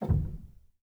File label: rel, Grand Piano; bitKlavier Grand; Piano Bar